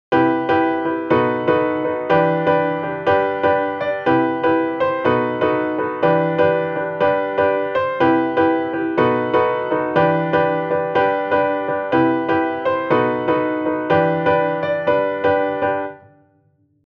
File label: Session Player Block Chords; 設定変更後のフレーズ; 8分音符を継続; Logic Pro 11; 隙間なく演奏されます